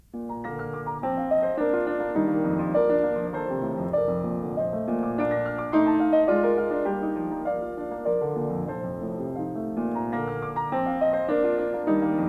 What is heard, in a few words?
Variations and Fugue on a Theme of Handel, for piano, in B flat major; Brahms